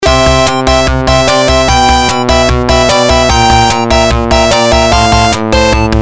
PC-8801

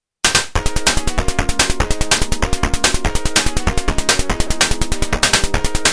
MZ-2500; Nobo

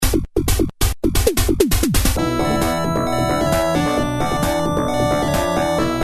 PC-8801; Water Front

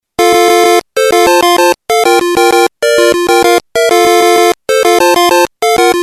PC-8801; Xevious

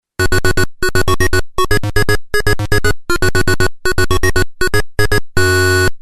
FM-7; Space Bee

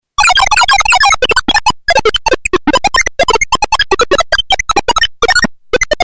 MZ-700; Catapilar War

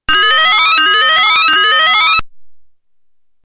PC-8801; Mssile

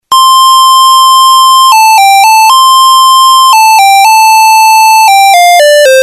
X1

